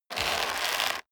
leather creak short hard

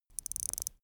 watch wind up

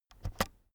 keyboard external space press fast soft

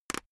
plastic pressured short soft

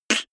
beatbox snare clap damped

